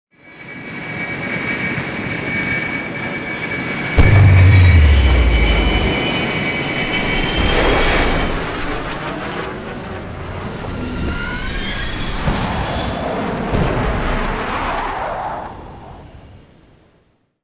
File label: militia intro dropship fly away; colony; Titanfall 2